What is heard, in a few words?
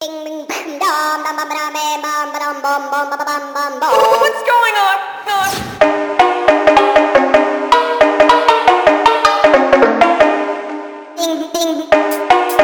crazy phonk speed up